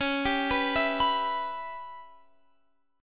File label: Db Minor 7th Flat 5th, Guitar, Half Step Down